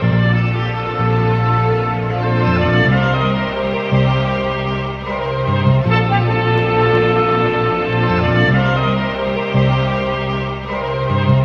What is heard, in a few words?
strings horns; Loops; Phonky